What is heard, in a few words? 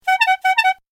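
iphone sms received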